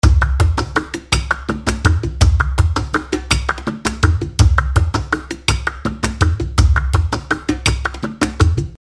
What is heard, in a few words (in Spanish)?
Tanguillos BPM 110